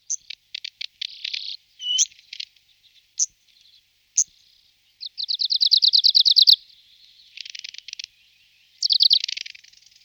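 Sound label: penochka talovka golos, Arctic warbler voice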